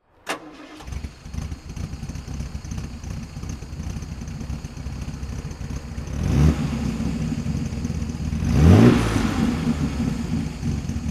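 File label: healey 3000 mk1 sidepipe throttle, engine sound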